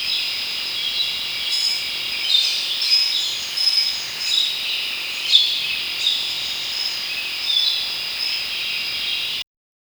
soft rustling of leaves

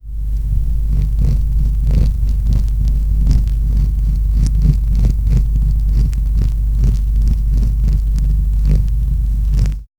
invisible pressure hum

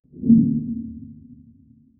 thunderstorm ambience wit; javanese

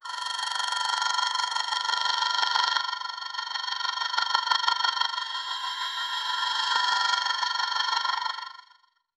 female robotic tricratops, dinosaur

